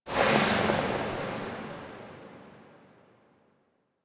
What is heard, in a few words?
Bow Tail, Weapons; Warframe; Corpus